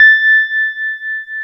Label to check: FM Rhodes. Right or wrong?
Right